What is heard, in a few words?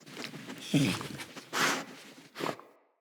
Wolf Cub Standing Idle Grunt; Vocals; Animals; Orengal Wolf Cub